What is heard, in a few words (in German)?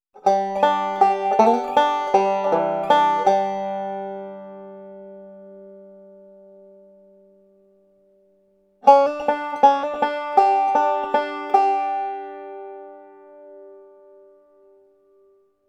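fill in licks auf g, banjo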